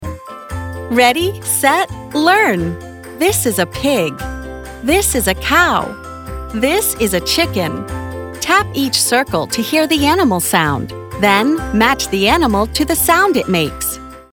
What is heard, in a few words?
Kids